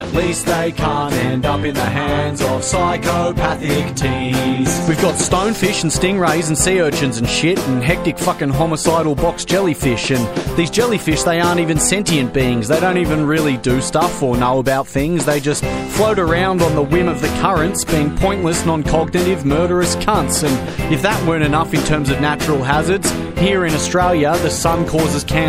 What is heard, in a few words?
australian ringtone